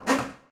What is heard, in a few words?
revert